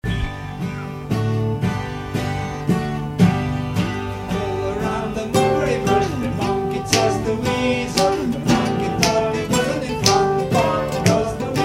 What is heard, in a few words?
Pop midi